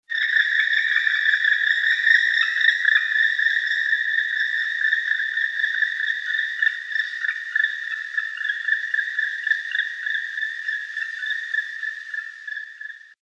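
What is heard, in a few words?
zhelna golos, black woodpecker voice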